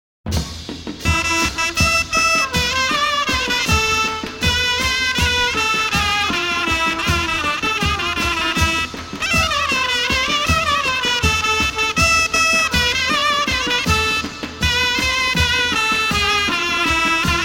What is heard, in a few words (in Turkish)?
Mehter